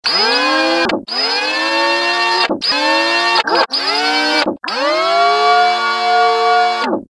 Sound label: servo action style seq